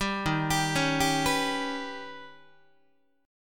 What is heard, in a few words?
D sharp Augmented 7th D sharp, Guitar 4A,4E,5A,5D,5G sharp,6C sharp; arpeggiated; JGuitar